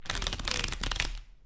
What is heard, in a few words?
Playing Cards Shuffling Cards Flipping Onto Table